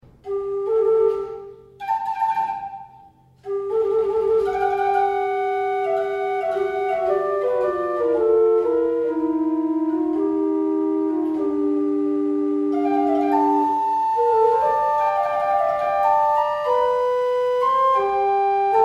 Floetenduo und trio

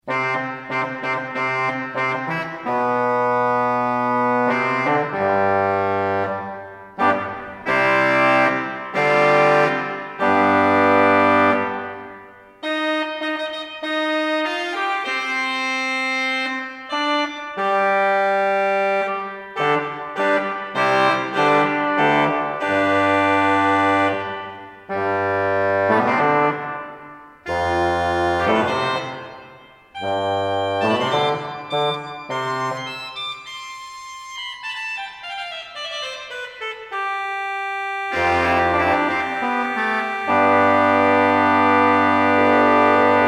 Posaunenchor

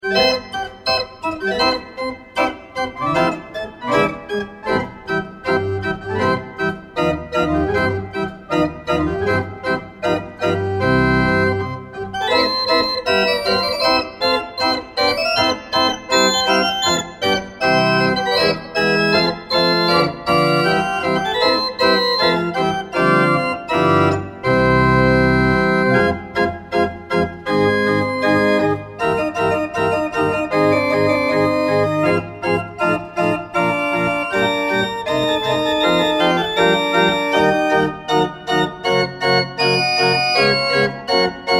Orgelmarsch

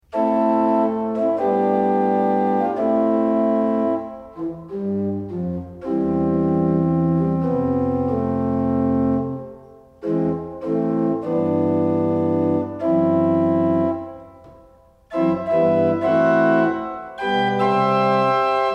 Prinzipalisch

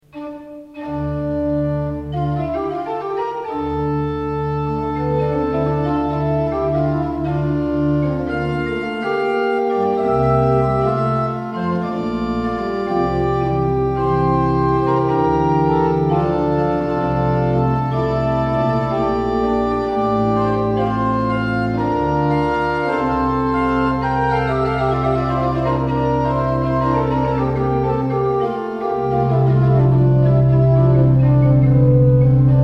Coppel Salicional und Flöte

